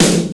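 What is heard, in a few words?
SD, snare drum; X-Static Goldmine 1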